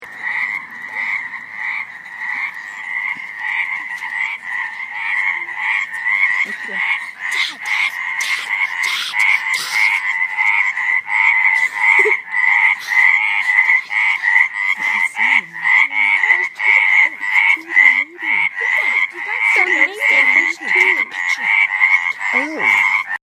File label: courting frogs